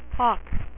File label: hawk; superheroes